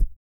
HICCUP; EURO HICCUP; Spectrasonics; Vocal Planet; Blues Soul

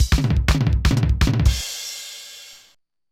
Loop, mxr; classic machines

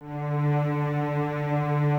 D 3, String Ensembles Cellos; Miroslav Vitous

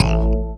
RZ BASS 2

